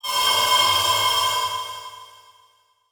Transition Feedback Ring; Adobe; Production Element

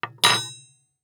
Household Knife Set On Wood Table Setting Table

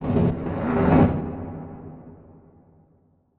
Transition Whoosh Fire

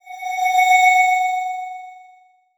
Transition Bell Swell; Adobe; Production Element

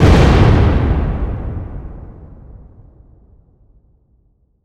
Explosion Blast Large; Fire and Explosions

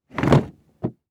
Industry Tool Box Pick Up

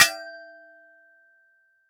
Impact Metal Impact Metal Pole Hit Metal Ring

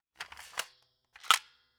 Foley Toy M16 Gun Cocking

